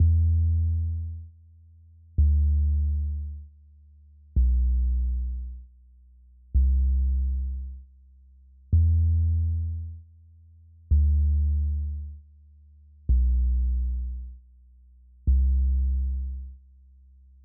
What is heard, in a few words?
Bass, 110BPM Emin; 99Sounds; Monosounds; Music Loops; PANDORA BOX!; Waterfall